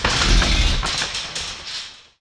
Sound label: spear start